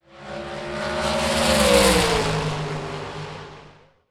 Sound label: Car